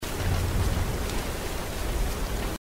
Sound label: waterfall; rise of the tomb raider